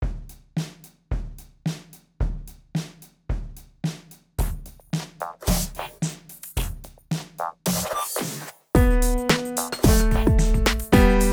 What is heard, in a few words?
Hot Cross Buns, 110bpm, Recorder; Recorder Club